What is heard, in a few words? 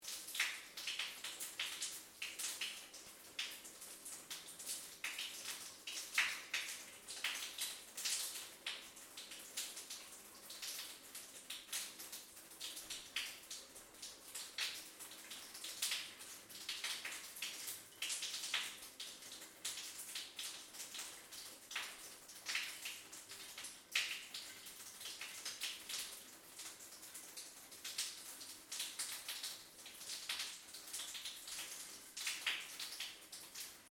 Water Drips Shower, Large, Tile, Loop; Water Sound Effects; Nature; BlastWave